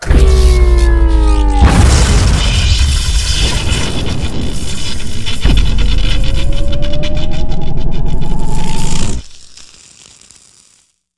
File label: core, star cores